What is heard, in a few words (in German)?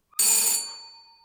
tuerklingel sound ding dong buzzer